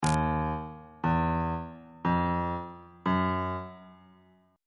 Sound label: e flat 2nd interval pitches on bass clef